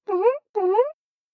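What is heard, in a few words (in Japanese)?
スライム 登場, モンスターボイス; voice monster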